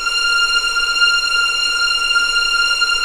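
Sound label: F6 legato piano, violins; East West; The Ultimate String Collection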